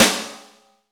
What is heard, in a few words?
snare, Roland; Club-50 - Foundations Roland; Ext.Snare 3